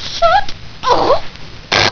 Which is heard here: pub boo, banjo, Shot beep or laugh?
Shot beep